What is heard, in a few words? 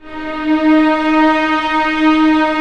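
strings D#4 PP R; Sound On Sound - Peter Siedleczek's Orchestra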